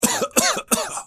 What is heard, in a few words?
male cough